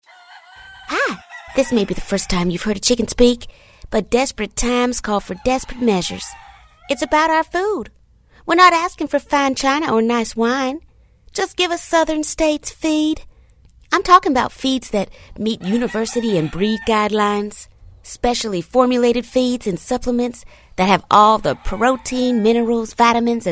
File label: Chicken Speak